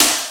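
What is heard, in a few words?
POWER SD, snare drum; Crypton - DrumBase5000; CD DRUMS #2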